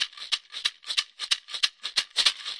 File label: 184 bpm rawhide shakers, DRUM LOOPS and BREAKS